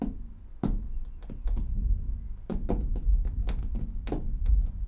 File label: Tapping, chairmat; Micro Pack